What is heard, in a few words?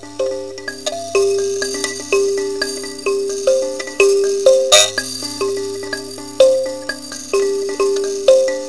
model, kalimba